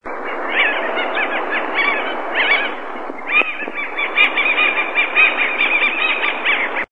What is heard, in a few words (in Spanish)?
voz taguató